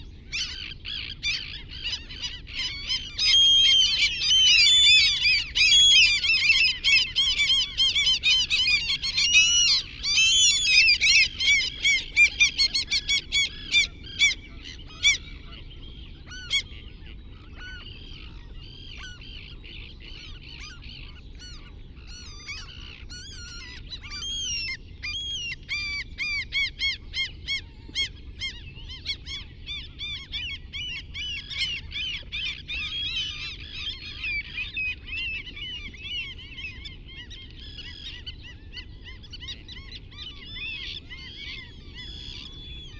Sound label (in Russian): sizaya chayka larus canus, zvuki chaek